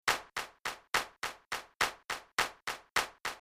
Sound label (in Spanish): Flamenco Guajira 70